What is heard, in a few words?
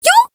Voice attack